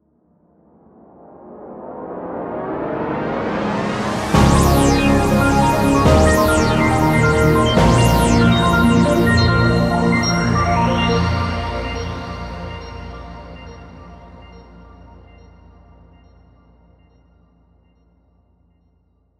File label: Oldskool Video Jingle